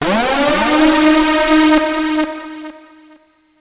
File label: School Alert